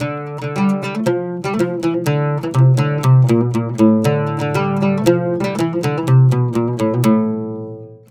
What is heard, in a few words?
120 oud